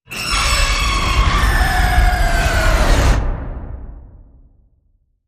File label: Creepy Bend